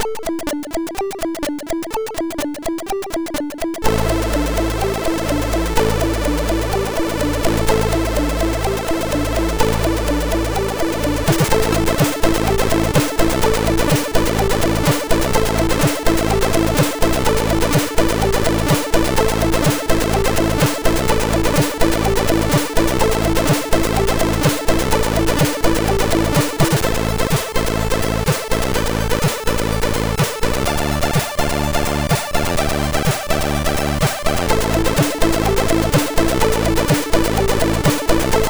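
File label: Meditation, MOS6581R2 SID chip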